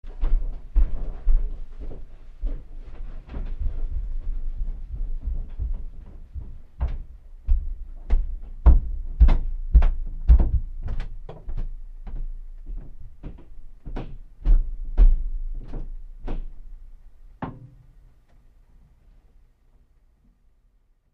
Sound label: Footsteps Up Stairs, Footsteps; Female Walking In Distant Tile Hallway